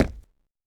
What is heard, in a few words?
concrete, Footsteps, Road